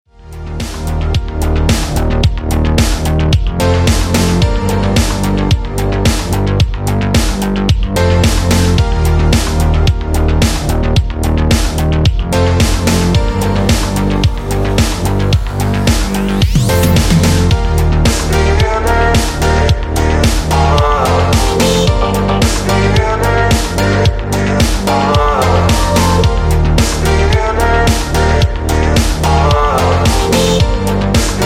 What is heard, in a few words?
Synthwave; antian rose; synthwave samplepack vol 01 memories